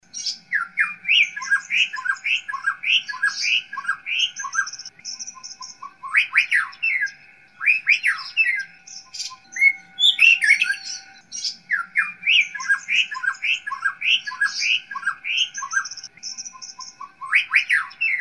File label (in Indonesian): audio murai bahorok